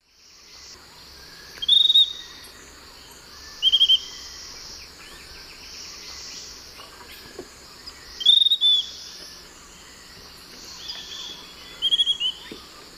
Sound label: Legatus leucophaius, song; S. America; Eastern Lowlands